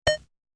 digicode; energie

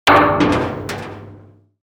bidon; nucleaire

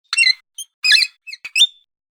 robinet; nucleaire

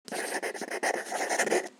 crayon; btp

